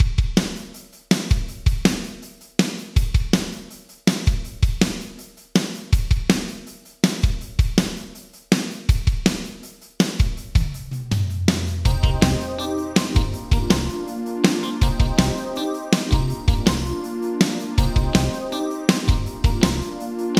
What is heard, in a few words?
Drums Sony DRE 2000 Reverb D Shorter ER Times Phase Inverted; Vintage Digital